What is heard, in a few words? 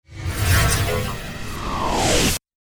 virus purge; jinteki